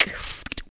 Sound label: Beat Box Scratch